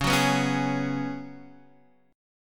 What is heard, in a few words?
C sharp 7th Suspended 4th, C sharp, down, Guitar, DADGAD